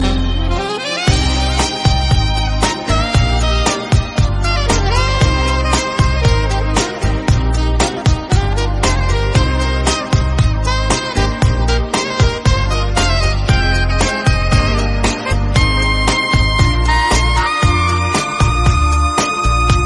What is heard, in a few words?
Love Music Ringtone; ringtones